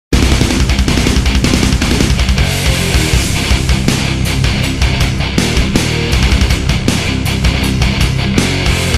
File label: tecnohard